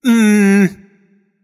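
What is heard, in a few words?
tongue, non-verbal; smite; voicepack; merlin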